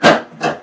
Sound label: solenoid